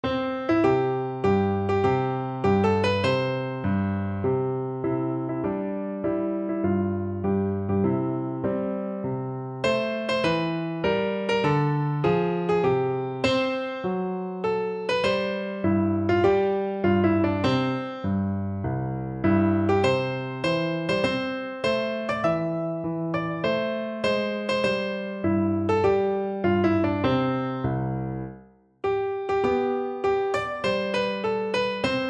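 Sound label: electric piano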